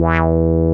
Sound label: 101 BS2, Vintage; INIS - Vintage